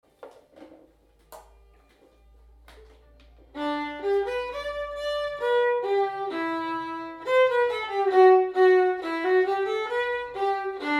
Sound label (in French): scottish lent seul, violon